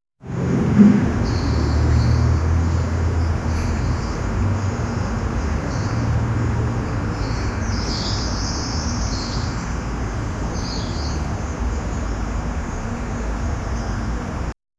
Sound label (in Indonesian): suara wanita menangis; human emotions